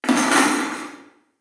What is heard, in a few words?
Diner Dish Walla; the princess and the frog